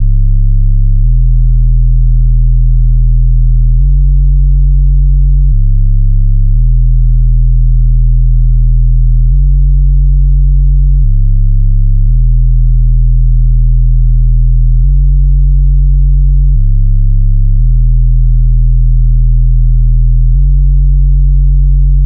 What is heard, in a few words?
Bassline 174bpm Gbm, liquid; DNBACADEMY